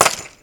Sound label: Key Drop